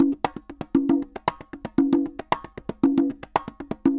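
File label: CONGAS SALSA, salsa drums and percussion; Best Service ProSamples vol.14; AKAI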